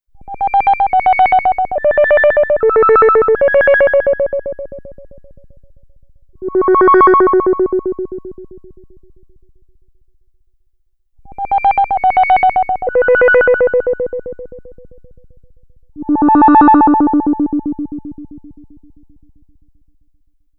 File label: Behringer System 100 Pitch LFO